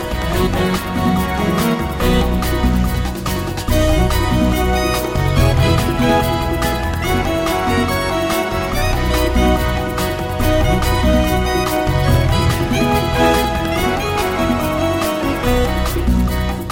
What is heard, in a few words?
Hot Pop Song